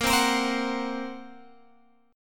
A sharp Suspended 2nd Sharp 5th A sharp, Guitar Open G